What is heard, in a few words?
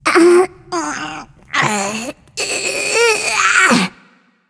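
nonverbal grunt lift; smite; voicepack; izanami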